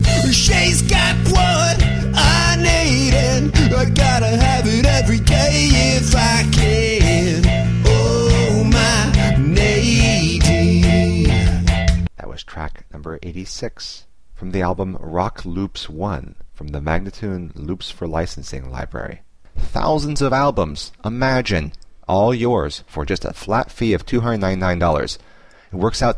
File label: 140 A, Rock Loops; Loops For Licensing